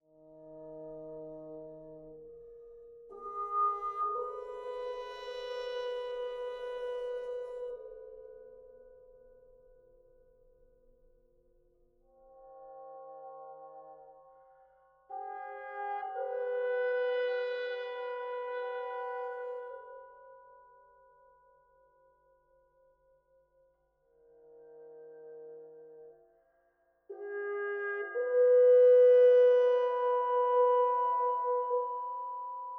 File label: objects Exceed Cymbal compare